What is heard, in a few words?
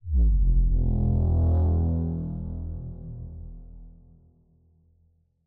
bass; music